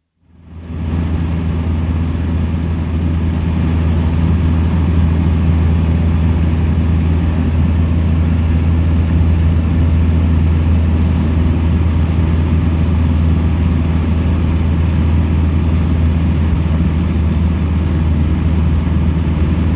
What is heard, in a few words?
Beagle Airdale, interior recordings, Lands (with buzzer); taxi & switch off; Historical; BBC